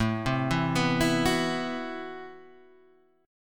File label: A Minor 6th Add 9th, Guitar, Standard; Am6add9 arpeggiated; 5,3,2,4,5,2